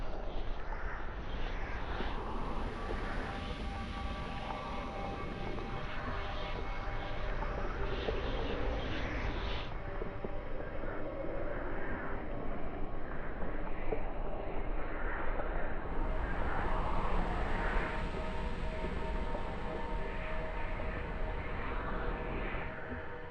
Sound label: shaman room atmos loop; Hollow Knight: Silksong